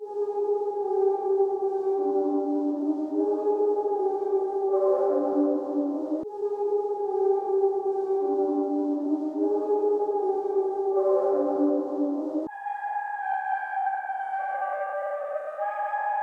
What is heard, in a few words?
77 BPM C Min Ghostly Pad; Cymatics; 2022 Melody USB Expansion; Origin